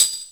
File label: Percs